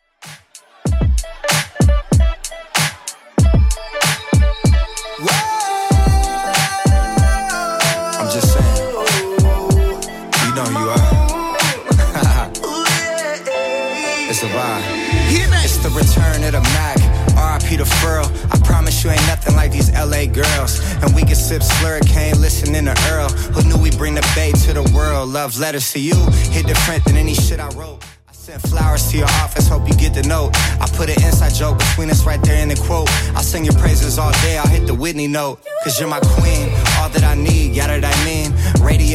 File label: 2ks Redrum 95bpm